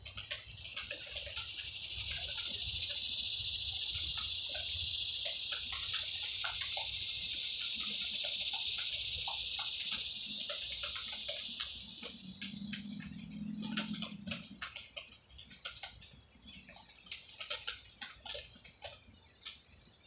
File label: katydid